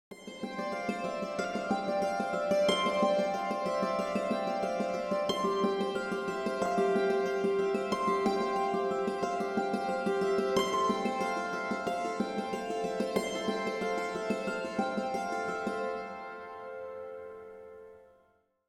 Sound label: Dulcimer